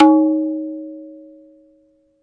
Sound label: hard tabla Thap; ETHNIC and WORLD PERCUSSION; Tabla Kit Hard